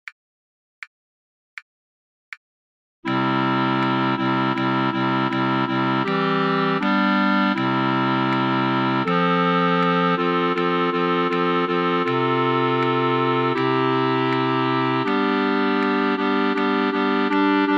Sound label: Click Chord Track Drama